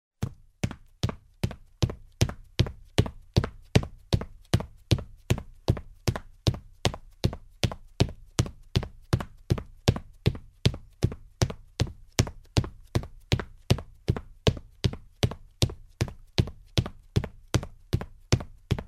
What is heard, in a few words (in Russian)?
zvuk bega v tufljah po derevjannomu polu